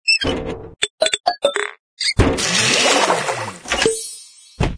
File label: Sugar Track Machine Sequence; candy crush saga gamerip